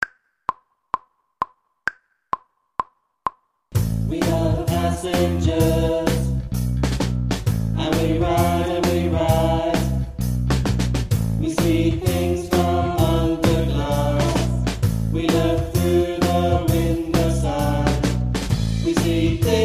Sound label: vocals